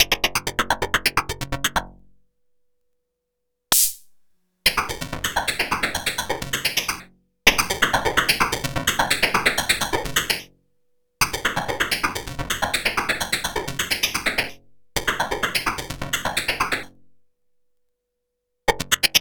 Hats High

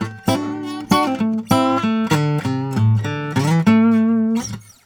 Blues Guitar Samples

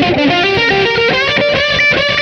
guit lead

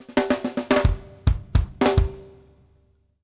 off snare, drum fills

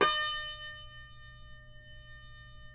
CUMBUS D#, Guitars; Spectrasonics; Hans Zimmer Guitars VOL-2

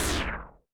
Roland TD-20 Percussion

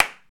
Hand Clap; Roland BK-5; Claps & Snaps

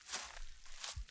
Rustly Step, Footsteps, outdoor

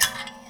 Finger Hit, Prepared Kit; Battery 3 Sample Kits

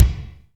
Reverb Kick Snare; PS-37 Dance Synths; Percussion

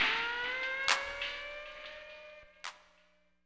END, Tekno Industrial; East West

